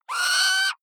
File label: owl shriek; natur tiere; voegel